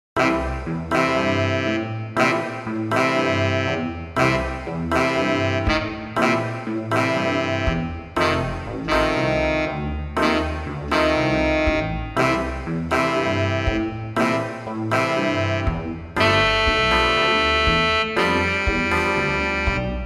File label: MIDI blues; spellman studio